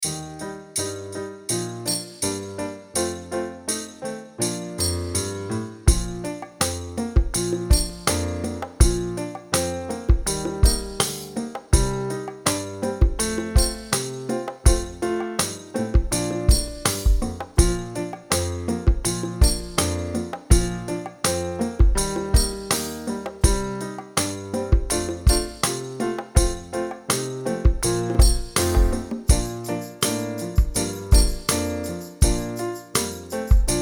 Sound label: jingle bells fast